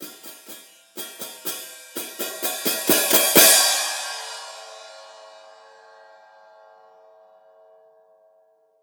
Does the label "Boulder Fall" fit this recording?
No